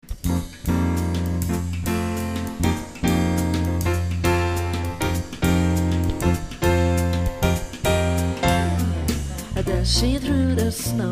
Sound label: jingle bells